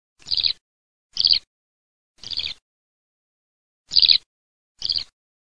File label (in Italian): allodola, birdsound